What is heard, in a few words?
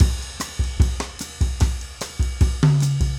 samba